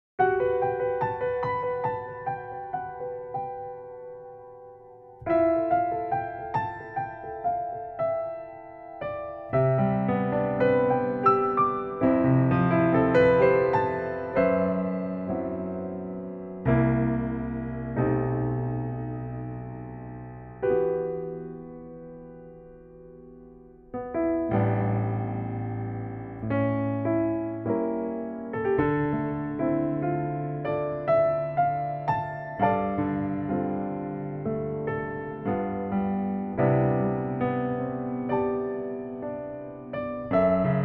piano G; key - G - vocal range - F to B; Here's a really intimate piano arrangement

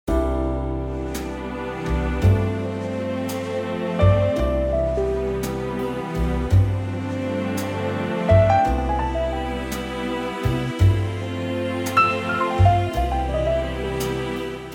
C to Db orchestral

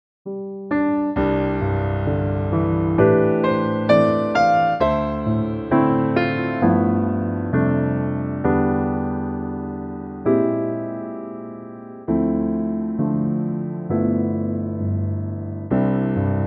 C Piano; key - C; vocal range - E to Ab

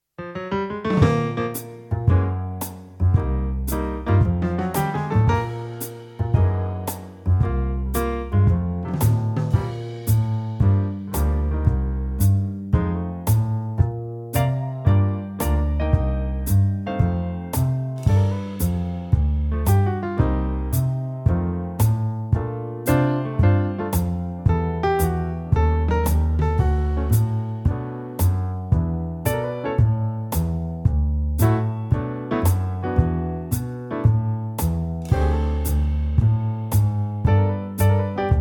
Bb swing

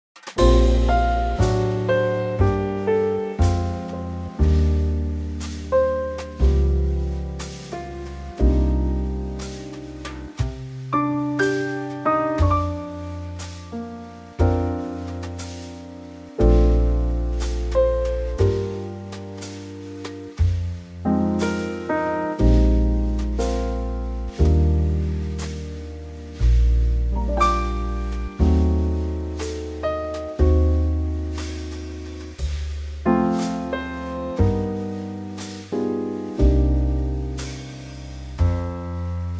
Bb Trio; key - Bb - vocal range - F to G; ballad in a superb Trio arrangement with lovely light piano lines

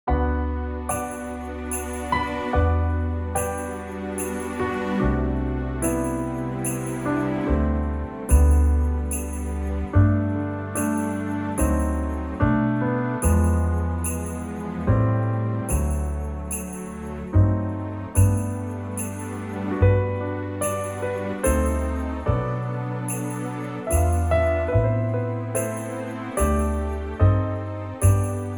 G strings

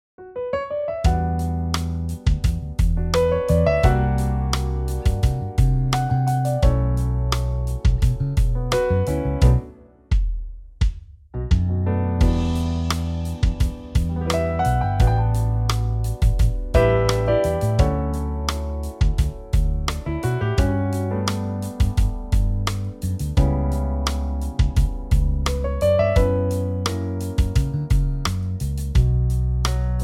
Smooth; key - Bm - vocal range - F# to A

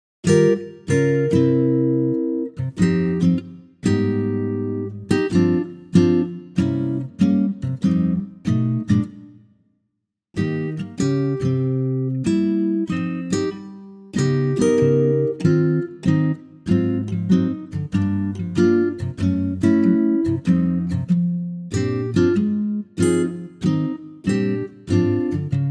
Ac Guitar Eb

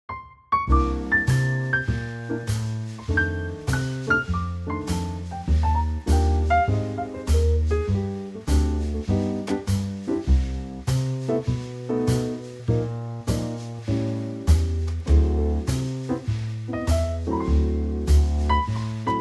C trio

